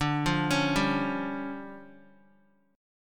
D Minor Major 7th Double Flat 5th, D, Guitar; Listen to DmM7bb5 arpeggiated; JGuitar

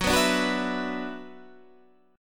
F sharp 7th Suspended 4th Sharp 5th, guitar

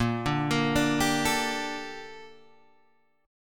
Bb Major 13th, Guitar, Open G

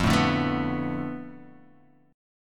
F 6th Flat 5th F down Guitar Open F, chord; F6b5 strummed

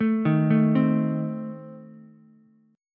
A Diminished A 2,3,5,3 down Ukulele; Adim arpeggiated; JGuitar

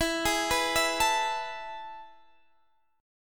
A Major 7th Suspended 2nd

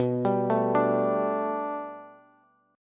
B Minor 7th Sharp 5th B Bass, chord; Bm7#5; arpeggiated; 19,22,19,19; JGuitar

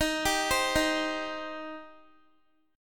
Eb 6th Eb down Guitar; arpeggiated

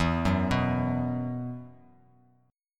E Suspended 2nd; Esus2 arpeggiated

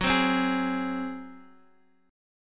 F sharp Suspended 2nd Flat 5th, Guitar, DADGAD; chordshape; down

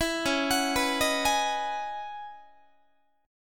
C sharp Minor 11th, Guitar; C#m11; arpeggiated; JGuitar

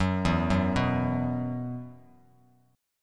E Suspended 2nd E, down, Bass Standard

